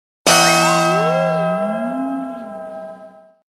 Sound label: wtf sound